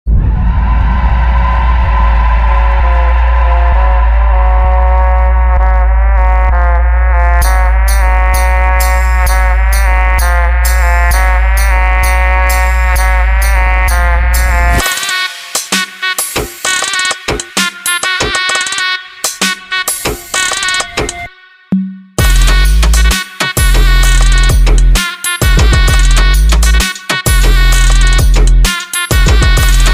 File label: instrumental; Mirzapur 2